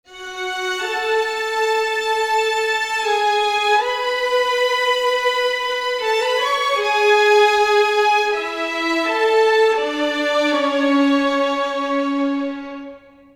80 strings hi part, F#min, Orchestral arrangements; PowerFX - Downtown Orchestra